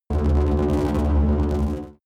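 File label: extrinsic buzz short, energy; energie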